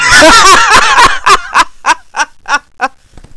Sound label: laugh